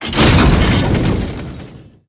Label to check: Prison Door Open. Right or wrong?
Right